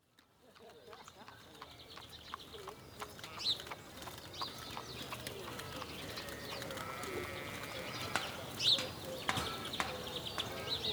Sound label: Ambience; PrinceOfGlory; OracleStudio